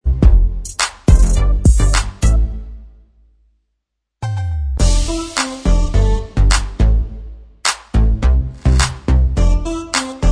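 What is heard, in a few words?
Instrumental Track, backing tracks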